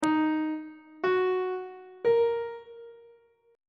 f sharp lydian chord 6 is d sharp minor on treble clef